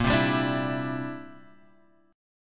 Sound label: C Major Add 9th, Bb, down, Guitar, Standard; strummed; Cadd9